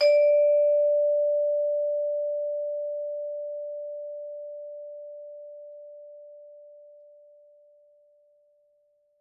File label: Vibes hard D4, Vibraphone, Hard Mallets; Struck Idiophones